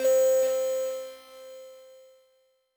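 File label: Key almost and electric guitar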